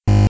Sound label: Generator Hum Loop; Playable Tutorial Level